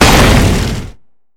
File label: Acheron obelisk hit; Arka